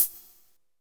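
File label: hi-hat, drums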